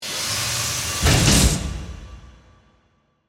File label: Machine Press, Scifi, Machine, Press, Sequence, Single, Press, Science, Fiction; Spacecraft; BlastWave